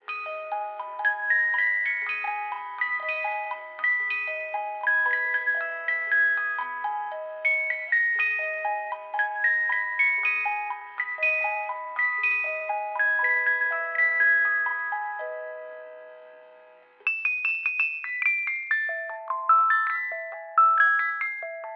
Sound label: lib radio; dm library b01